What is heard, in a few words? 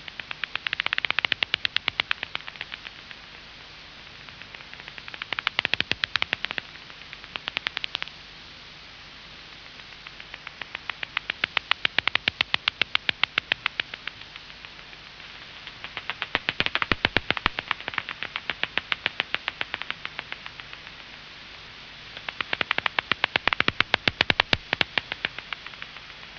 myotis myotis; chauve souris; sonotheque